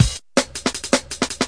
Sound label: 161 mobile roller, drum loop; 161 to 180 bpm